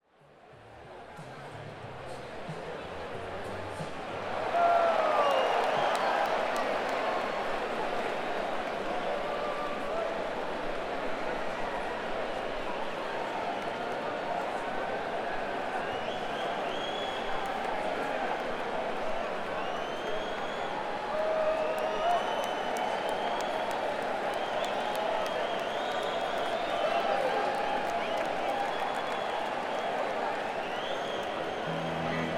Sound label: Crowd, Coliseum; Hampton, VA - Hampton Coliseum